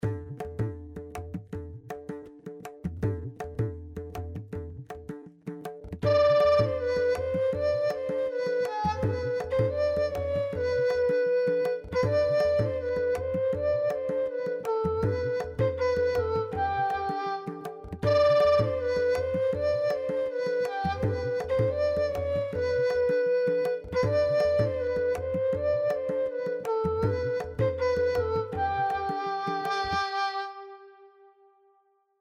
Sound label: Dizi large Live; Mukund